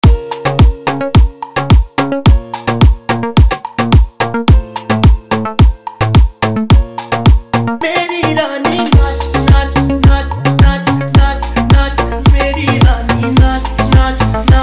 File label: Punjabi Remix Song